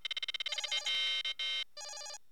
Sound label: Console Confirm; Star Trek